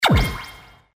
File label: Baseball Result Homerun; LG GD900 Crystal; Game Sound